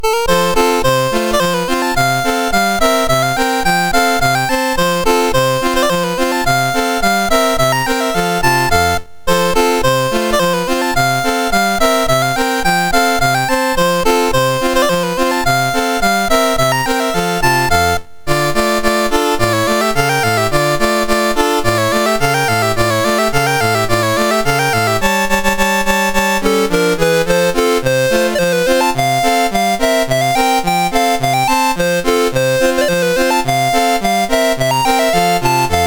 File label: Polish Polka, MOS6581R2